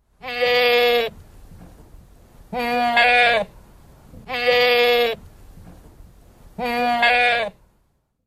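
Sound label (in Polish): owca, głos owcy; czterolatki; karty aktywnosci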